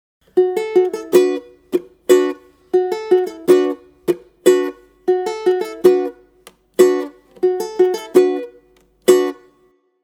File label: Waits Riff, ukulele